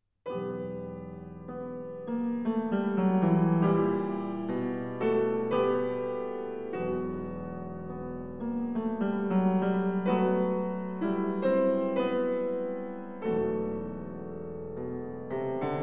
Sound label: Hymn; Fourth Way; Piano